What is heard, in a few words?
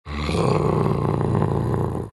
growl; bloodseeker